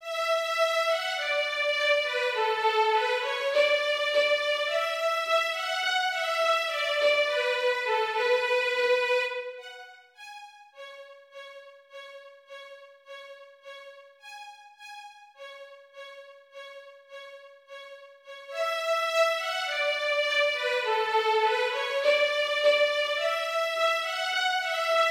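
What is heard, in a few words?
Bartok For Children Violin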